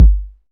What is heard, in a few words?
low tone kick drum sound g sharp key, Royality free kick tuned to the G# note